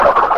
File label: crash spin